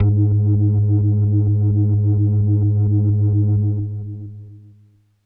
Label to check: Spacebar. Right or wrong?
Wrong